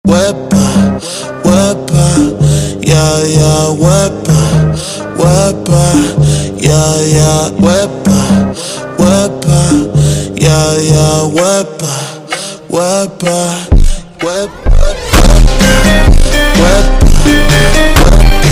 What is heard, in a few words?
Funk Ultra Slowed